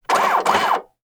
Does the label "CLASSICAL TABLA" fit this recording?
No